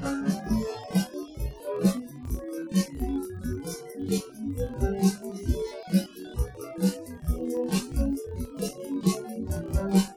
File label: drums; sound source separation methods